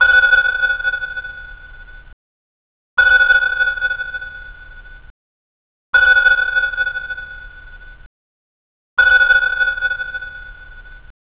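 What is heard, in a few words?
sonar, submarine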